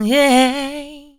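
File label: "yeah", female vocals, left; PlatinumPhattCD2; E-MU Producer Series Vol. 8 – Platinum Phatt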